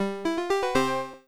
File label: Success, Jingles; Success4.wav; TowerDefenseGame